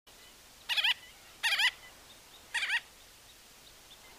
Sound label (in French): sterne hansel